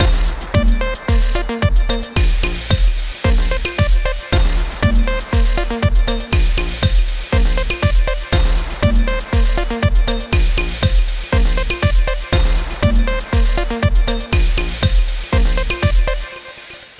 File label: Mpc studio testbeat; MPC tests